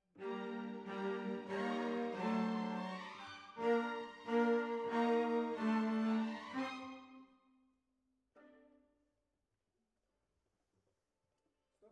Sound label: Cellos